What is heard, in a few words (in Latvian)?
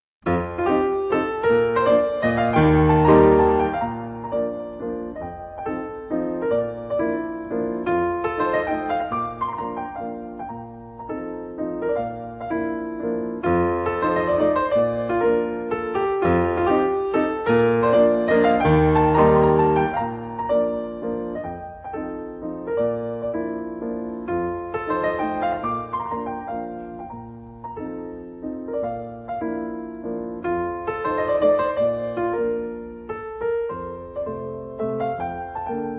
mazurka si bemol mazora; f.sopens